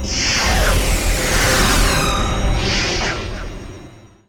HYDRAU; Best Service; Advanced Mega Effects

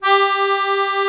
AKK VIOLIN, akkordeon; Best Service; Alpine Volksmusik

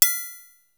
TRIANGEL; Music Store Sound Service; Einstein

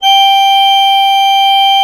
AKK ORGAN G3, AKKORDEON; Best Service; Alpine Volksmusik

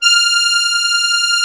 MUSETTE F3, akkordeon; Best Service; Alpine Volksmusik

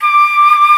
FLOTE, Alpine Volksmusik; Best Service

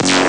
Klirr; ST-XX Amiga Soundtracker Sample Packs

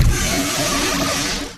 ROBOTE; Best Service; Advanced Mega Effects

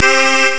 Chor; Amiga Soundtracker Sample Packs

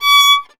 GEIGE; Best Service; Alpine Volksmusik

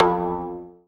ZIMBAL F 1; Best Service; Ethnic Journey Sound Cube

